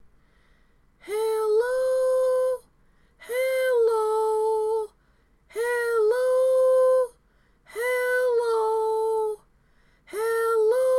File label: Tenor, barbershop